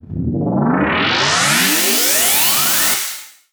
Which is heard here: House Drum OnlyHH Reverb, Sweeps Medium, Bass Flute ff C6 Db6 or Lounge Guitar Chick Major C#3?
Sweeps Medium